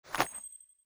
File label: UI Acquire; SandBox Musics